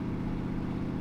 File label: car; Cars; XBOX